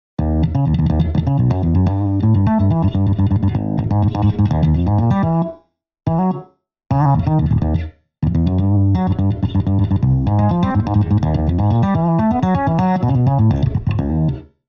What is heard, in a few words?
BASS LOUD